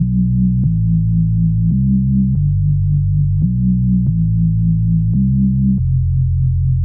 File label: Bassline, Club Techno, 140 BPM; Zero-G; Construction Kits